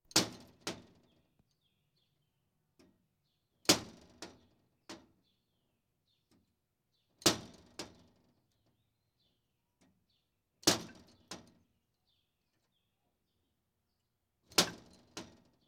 Police, Fire engines

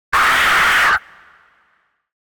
Alien Screech Scream - Monster Dinosaur; Hollywood Edge; sound designer's toolkit v2

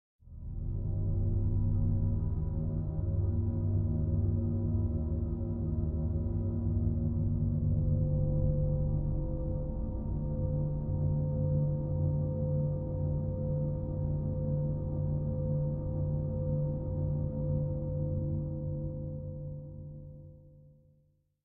Drone Low End Sub Pad - Suspense; Hollywood Edge; sound designer's toolkit v2

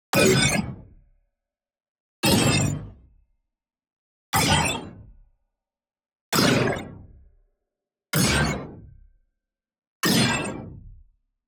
neon hit small plasma bubble burst; boom library

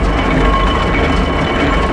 Door Large Stone Loop; Doors

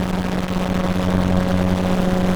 ELECTRICITY Distorted loop; loop mono; Universal Sound FX

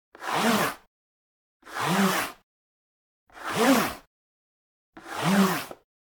Generic Slide; boom library; The Interface

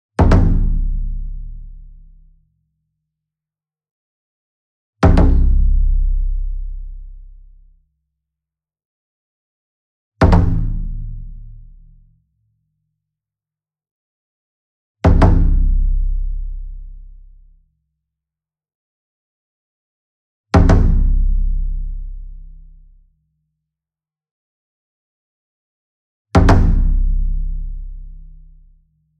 Single Drum Taiko 28 Inch Double Soft Beater Near; boom library; Cinematic Strikes Construction Kit

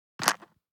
DICE on Cardboard, Grab, Standard, 6 Six Dice; Samples; ArticulatedSounds; DICE Sfx Party (Extended)

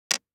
DICE on Plastic, Drop, Standard, 1 One Die; Samples; ArticulatedSounds; DICE Sfx Party (Extended)

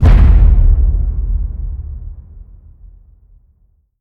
HIT Boom, Cinematic Hits Designed

